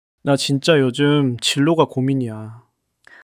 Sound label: struggle